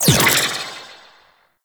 Tether Zap; Warframe; Venus; Venus Hyena Hotrod; Abilities

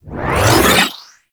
Scythe Charge

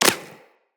Bullet Impact Flesh; Weapons; Bullet Impacts

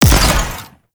Proj Explosion; Warframe; Sentient; Conduit

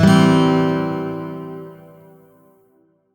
Shawzin Pent Maj Chord F; Warframe; Lotus Shawzin

